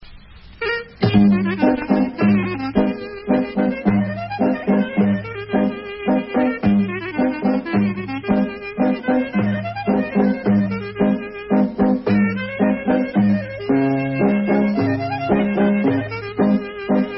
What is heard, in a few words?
Greek Epirus